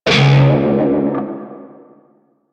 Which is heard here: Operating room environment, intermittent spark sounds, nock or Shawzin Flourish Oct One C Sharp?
Shawzin Flourish Oct One C Sharp